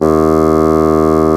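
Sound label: HARD BASSOON D#2; Northstar - New Gold VOL-2; SOLO BASSOON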